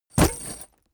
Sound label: leather metal bodydrop